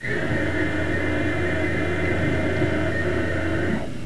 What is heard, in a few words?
Flaps; Virtual Wings